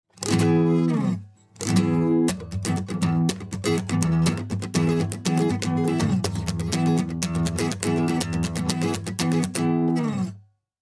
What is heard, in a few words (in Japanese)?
e コード